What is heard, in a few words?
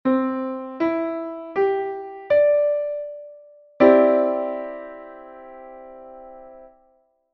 Cadd9